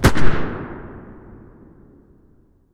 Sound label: beretta muzzle mono far